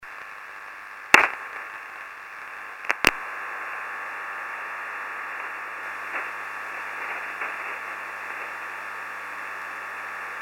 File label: 4065 khz